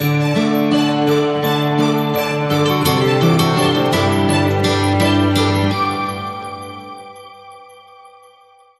warm family Christmas no drums sting

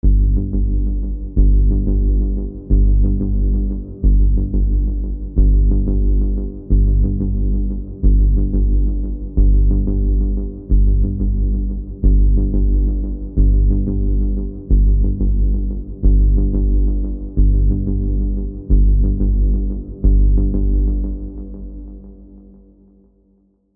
Synth Bass Pulse

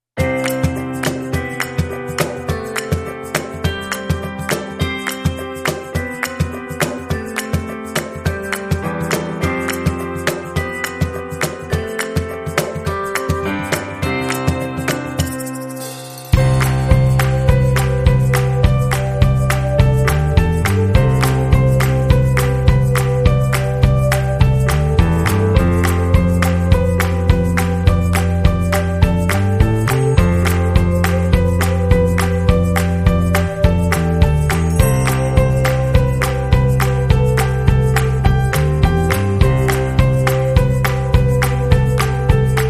No Ukulele No 12 String Guitar

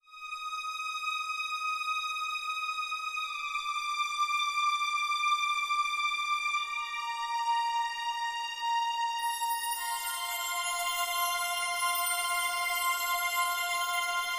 No Piano Or Woodwinds

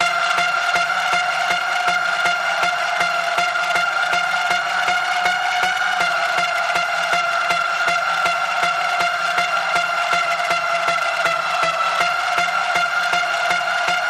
Paranormal Panning Pulse F, Key of F

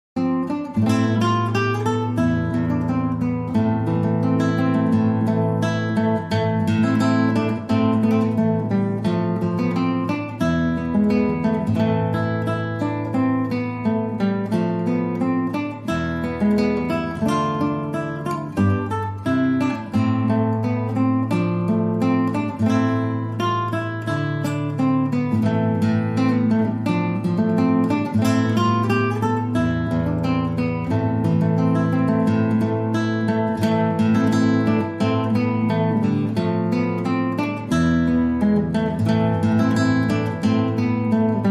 Solo Guitar

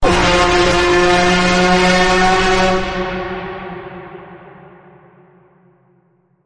Full Bend Ahead, F

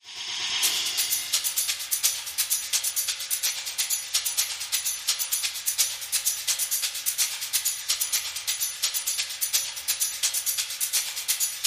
Invisible Drone Only Rhythm

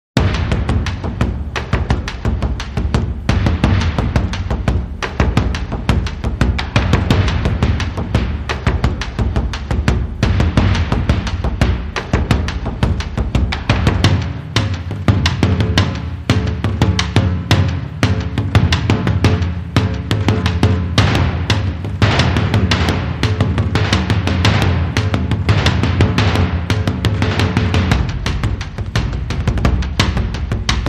Mid Drums

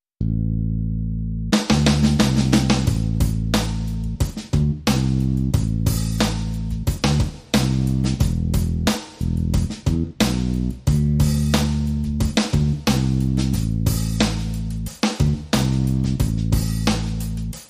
Banjo Blues Drums Bass

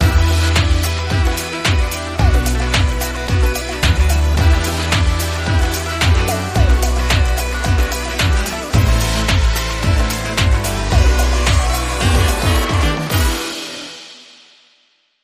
Disco

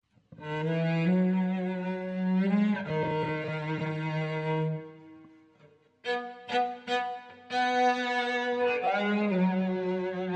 Cello

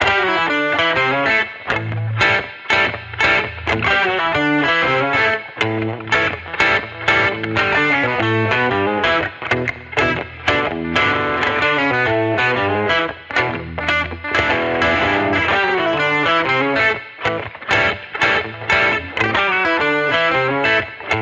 Electric Redneck Guitar Only

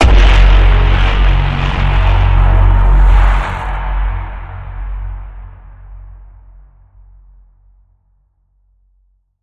Bend, F sharp

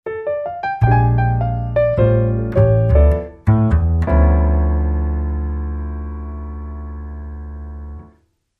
Cool And Sneaky, Sting

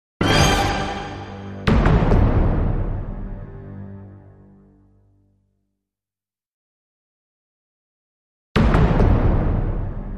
Stabbing Hit

